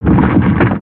GF Lava Rumbles; gnarled forest

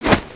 cloth short; creatures organic

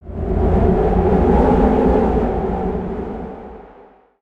Deep Cave Ambience

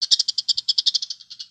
Pets Skunk Happy; creatures; Lego

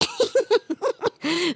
fart

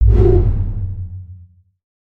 Pillar Shockwave

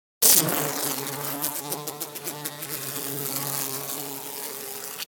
Jungle Insects; gnarled forest

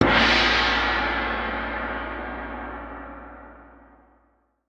gong

